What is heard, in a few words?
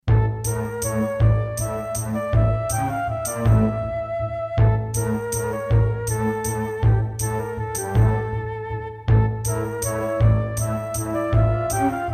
Roland SC-8820